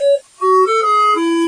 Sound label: add coin; VIDEO GAMES; boothill